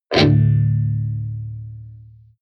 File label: electric guitar distorted powerchord low muted